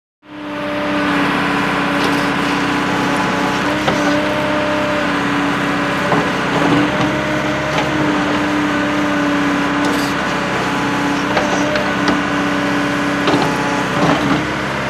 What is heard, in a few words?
Digger; Jcb Digger At Work. High Revving Engine With Clanks, Revs Die Away, Digger Into Gear And Moves Off To Mid Shot And Stops; earthmover